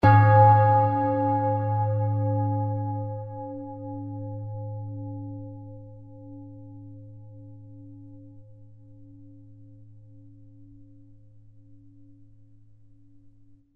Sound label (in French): Silence + Cloche